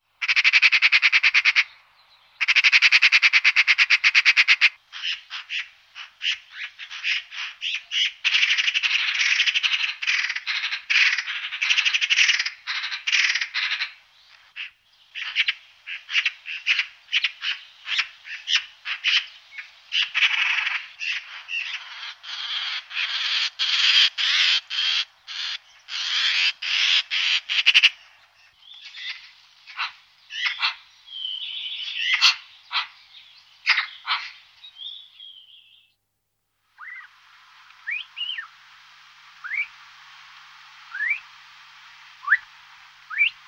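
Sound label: soroka golos, magpie voice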